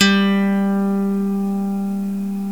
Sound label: SWEDISH; World Combo